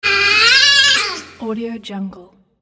baby lion

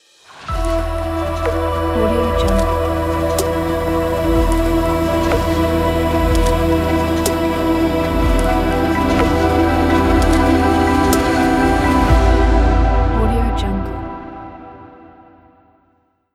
mystical logo

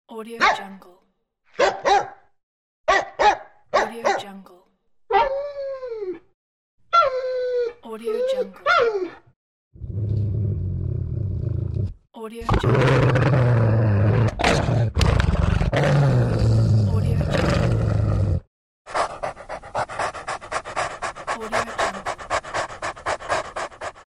dog pack

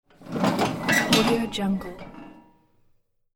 money weight scale; dayton; applehillstudios

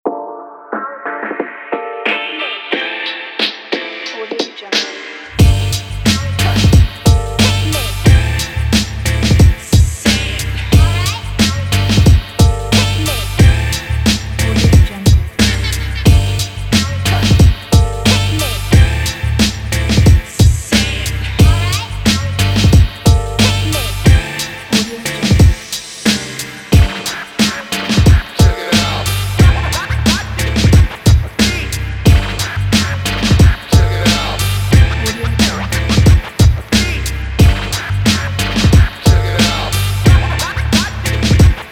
that hip hop; audioglow

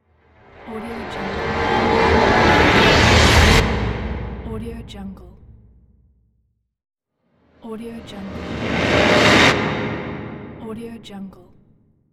ghost whoosh

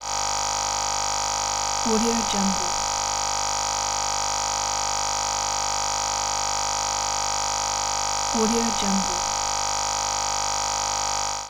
voltage generator

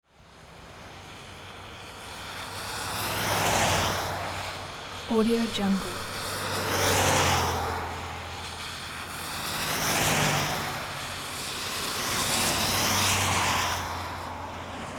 street traffic in rain

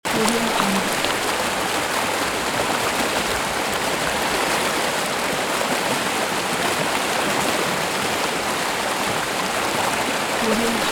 rushing water river

